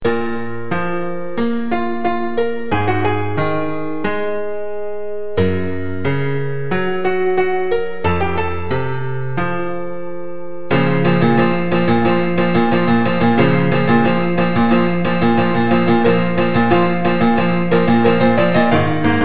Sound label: piano; zelda